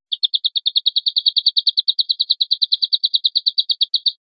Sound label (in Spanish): rep sonajas